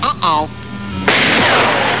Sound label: uh oh bang